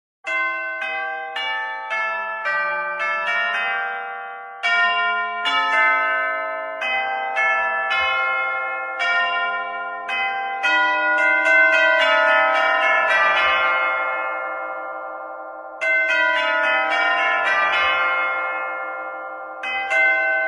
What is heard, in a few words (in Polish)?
Dzwony rurowe